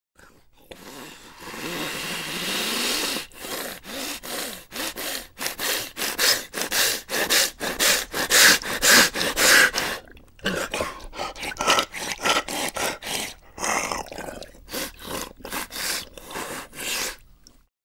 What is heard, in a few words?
Monster Zombie